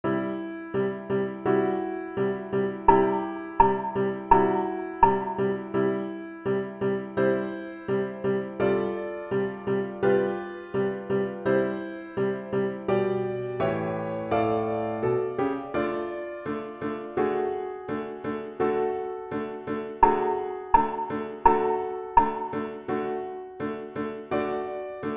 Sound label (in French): alto